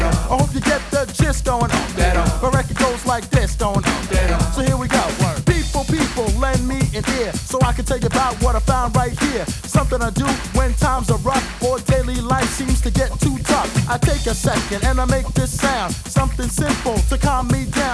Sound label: hiphop